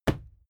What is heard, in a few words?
fight impact sweetener cloth; krieg-waffen; nahkampf; soundpool